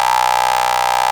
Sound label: RAVE BEEP; Best Service Alpha Dance vol.2